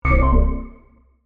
Computer Tone, Scifi, Computer, Tone, Musical, Synth, Science, Fiction